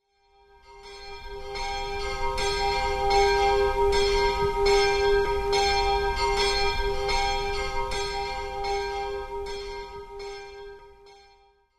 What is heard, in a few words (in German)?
katholische kirche